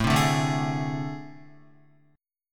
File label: A Minor Major 7th Double Flat 5th, down, Guitar; strummed; JGuitar